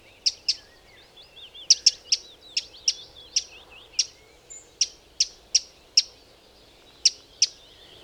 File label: eurasian wren troglodytes troglodytes